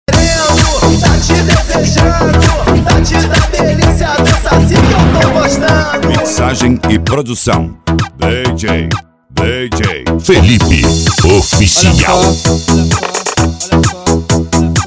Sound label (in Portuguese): eletro funk pancadao